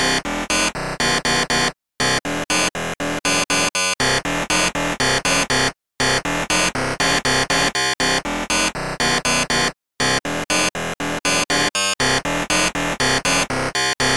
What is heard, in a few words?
Robot Song